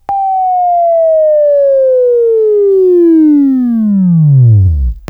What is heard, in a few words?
Buzz, Left; Sweeping Training